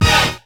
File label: DISCO FX; Zero-G; Funky Elements